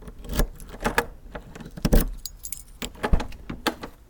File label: entering a house